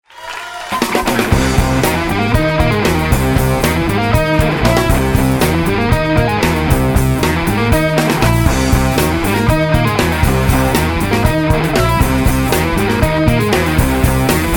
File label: funk; rabbit